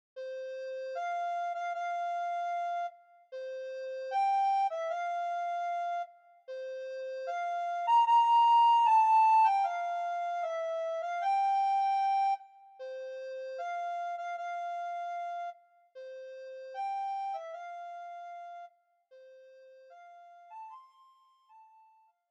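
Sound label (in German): solo Hochzeitsmarsch, Sopranblockflöte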